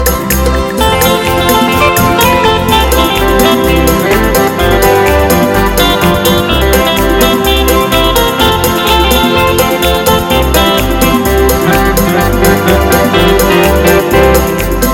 Fast and Quick

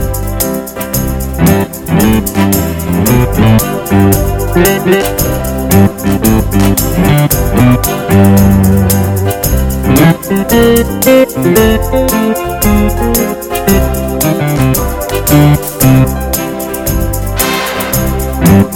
A Slow but Happy Fox

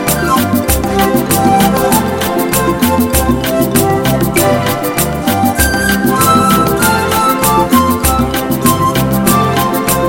Hot and Snappy Cumbia

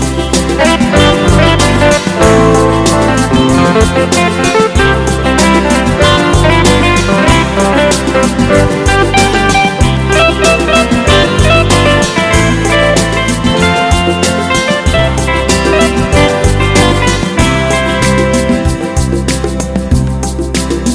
Beautiful Guitar Beat

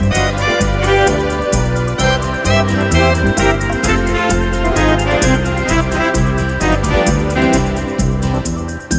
Sweet Soft Jazz

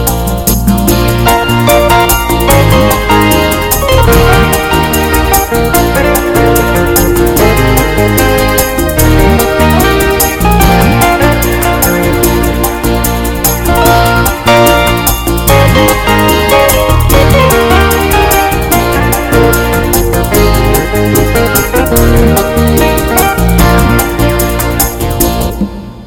Fast Clean Rock

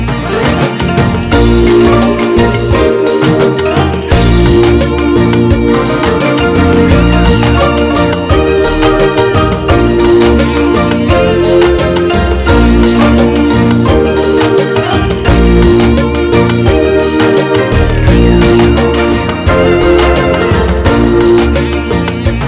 Super Calypso

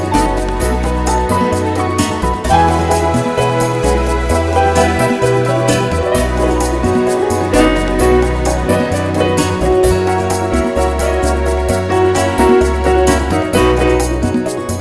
A Smooth Bossa Nova

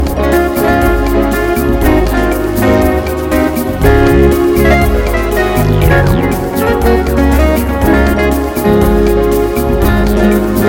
Hot Smooth Swing

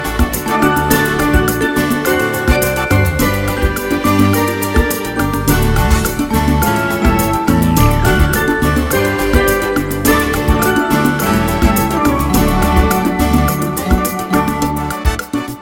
Smooth Salsa

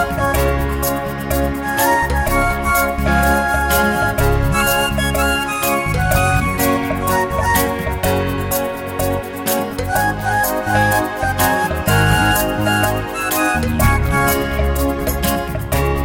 Smooth Vintage Pop

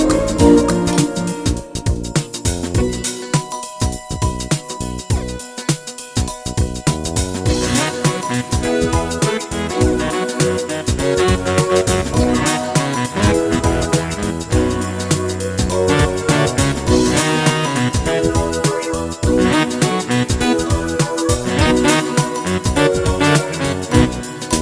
Funky but Sweet

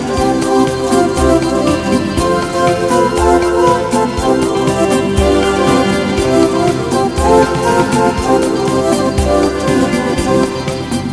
Happy Latin Party

